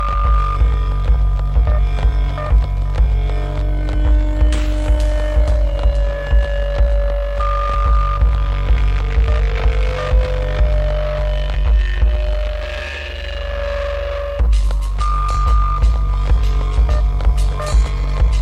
radio bleep

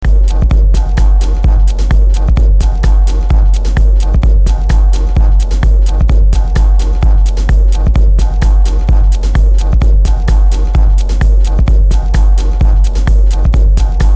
dub frequencies

